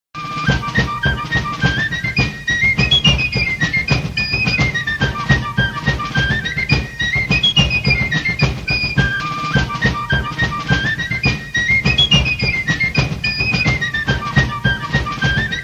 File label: Drums & Guns